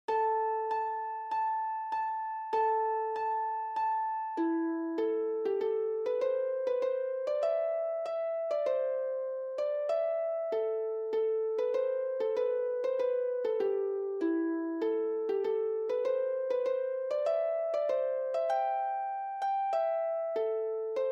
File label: King of the Fairies Harp; Zephyr Rehearsal Tracks